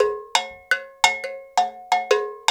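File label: Afro, percussion loop; Roland LCDP14 Africa VOL-2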